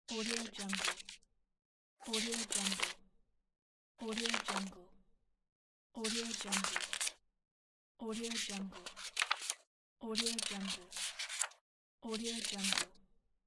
paper sounds

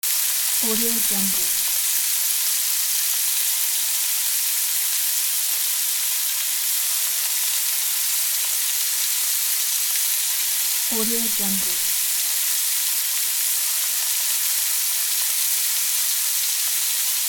sizzle

